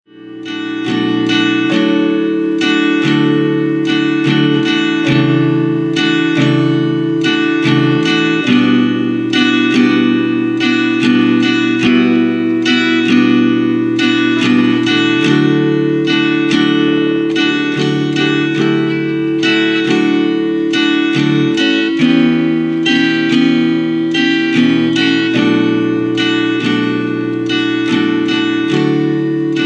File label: tremolo